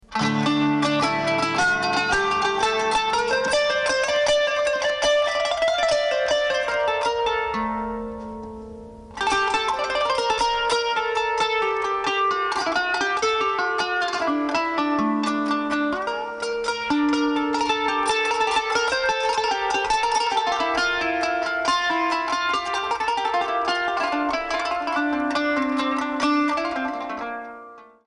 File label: kanun